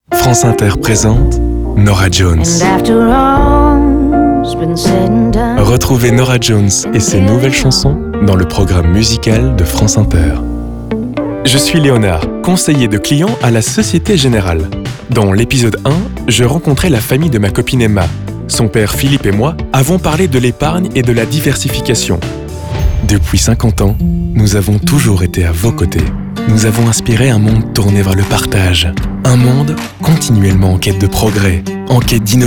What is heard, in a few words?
medley voice